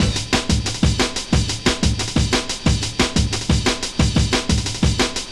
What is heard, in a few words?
Funk Break, Drum & Bass; Fluid Dynamics